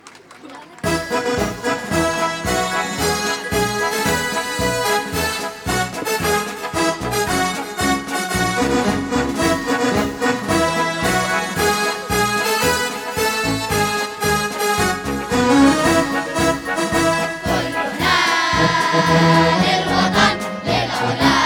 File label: Kids Chorus Singing Lebanese National Anthem